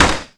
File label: ollie wood, terrains; Tony Hawk's Project 8; XBOX